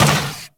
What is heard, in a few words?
Moa Combined Step Default, Footsteps; Warframe